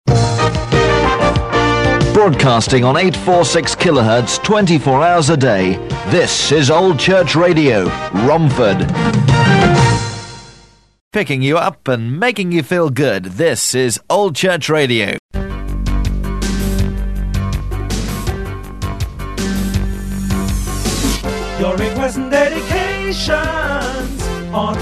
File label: JINGLES; BEDROCK